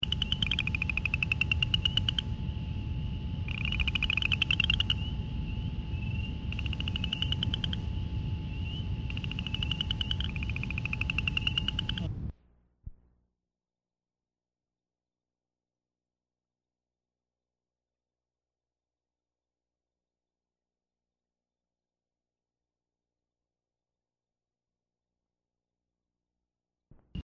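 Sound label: toads New Jersey Chorus Frog; wildlife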